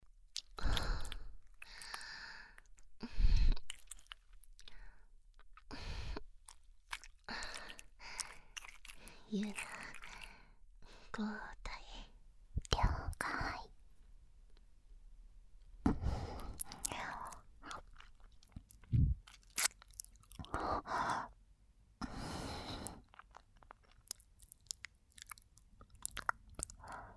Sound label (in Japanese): 吐息たっぷりの耳舐め 手コキ 左耳, バイノーラル 囁き 吐息; 快楽夜伽